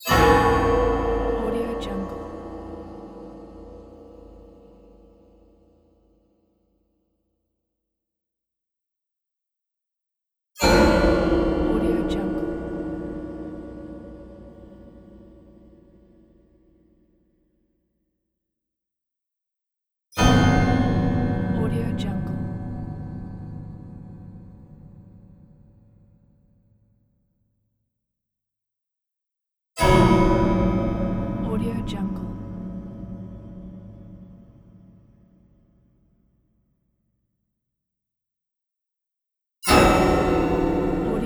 dissonant horror impacts

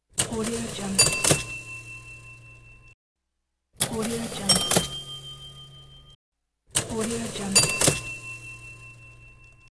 cash register

